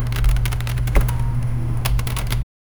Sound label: kaching cash register kaching, transaction